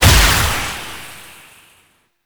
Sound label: Projectile Death Tech Medium; Weapons; Warframe; Duviri; Incarnon Adapters